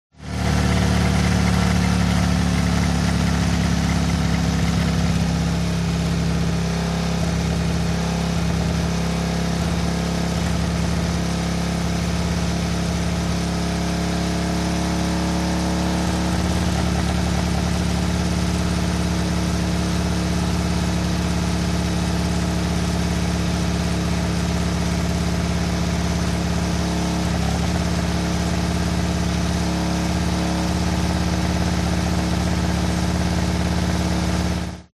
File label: TRANSPORTATION MOTORCYCLE Harley Accelerate Decelerate Soft